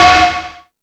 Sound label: Found Sound, E-MU Pro-Cussion